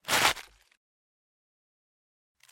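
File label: sounds of lettuce leaves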